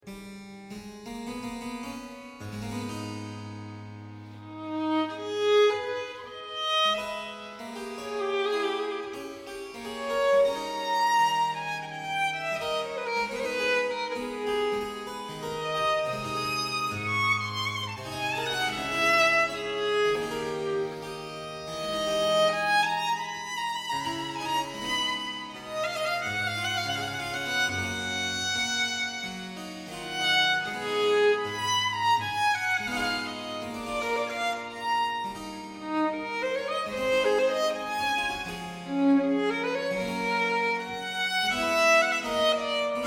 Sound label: Andante in A minor; HWV 412